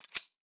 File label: ARROW